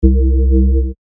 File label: Bass Shot C; synth shots tech house; Addicted; revealed